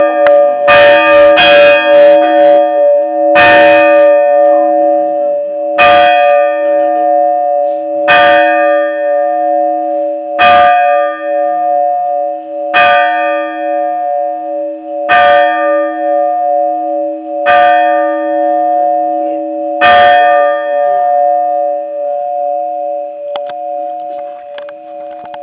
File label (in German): torschau glocke; Torschauer Glocke; mramorak